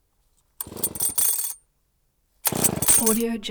chainsaw start attempts

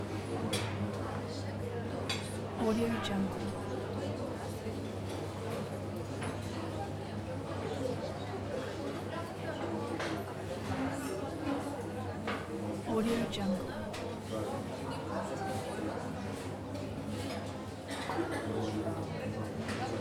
restaurant ambience

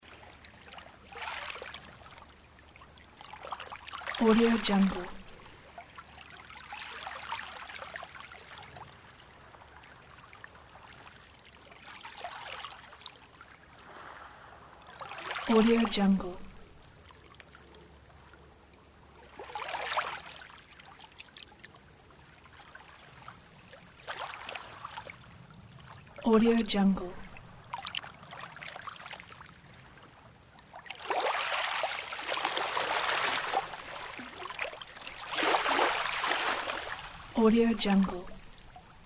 light calm waves at the sea

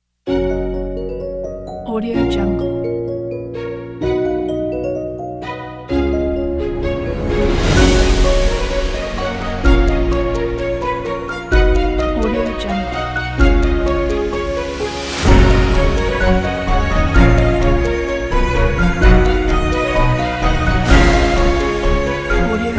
holiday cinematic logo

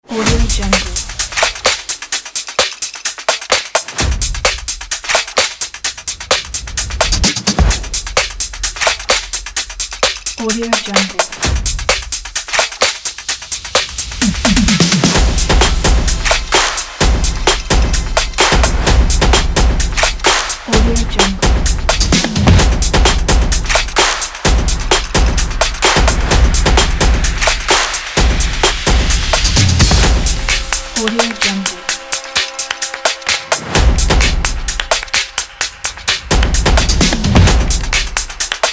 the fast stomp

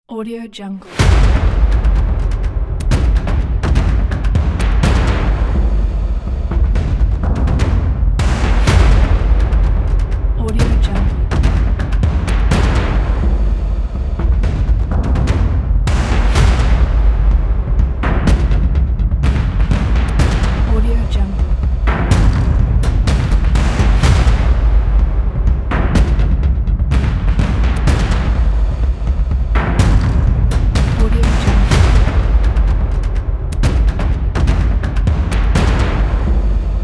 the epic drum

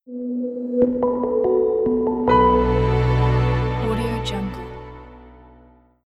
clean logo

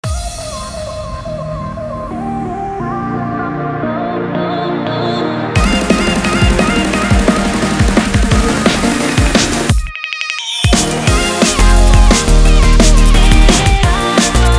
drum and bass

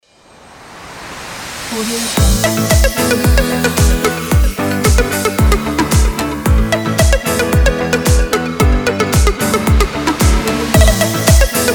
happy moving up

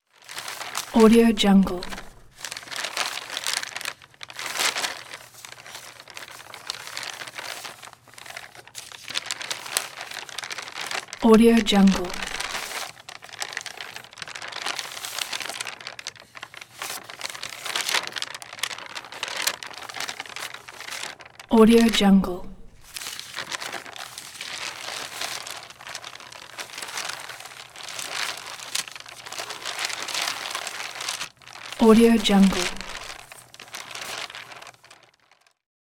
paper rustle

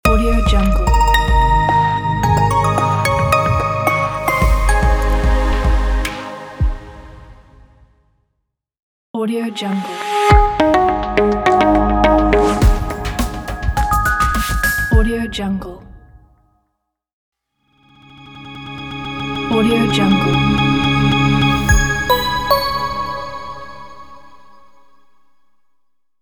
liquid logo pack

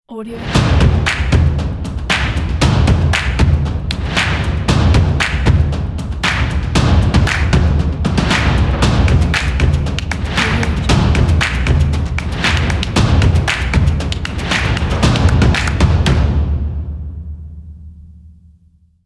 claps and drums logo

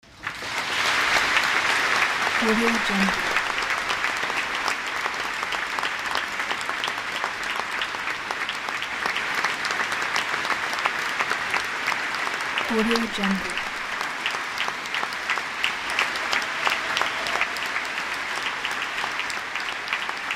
applause

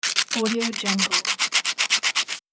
sandpaper

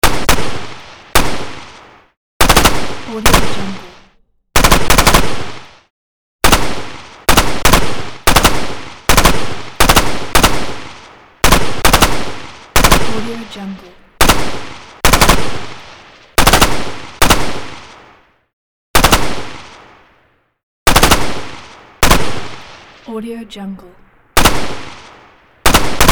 m4 carbine assault rifle random fire

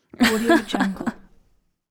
evil woman laughing; flatcatstudio

